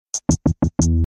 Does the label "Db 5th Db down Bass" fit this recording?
No